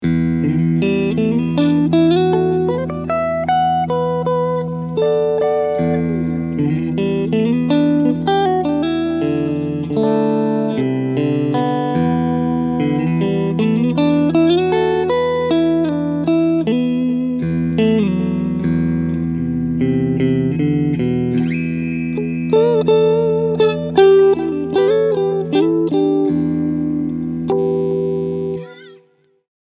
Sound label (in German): Clean FrontMitte